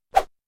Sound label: Swoosh; Dino Donkey Dash; Swoosh Sign